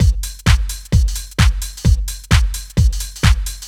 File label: DRM, LOOPS 130, House; Best Service ProSamples vol.10 - House; AKAI